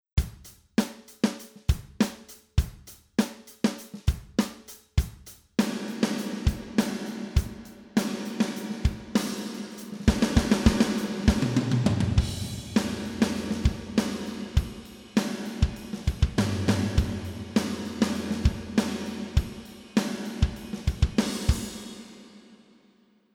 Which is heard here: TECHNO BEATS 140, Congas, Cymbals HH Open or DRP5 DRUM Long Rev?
DRP5 DRUM Long Rev